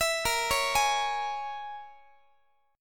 A sharp Minor 7th Flat 5th A sharp, Guitar 4A,4E,5A,5D,5G sharp,6C sharp; A#m7b5; arpeggiated; x,x,19,20,17,19